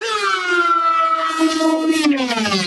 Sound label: goofy robot scream